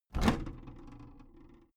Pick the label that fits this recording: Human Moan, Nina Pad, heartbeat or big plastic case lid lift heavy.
big plastic case lid lift heavy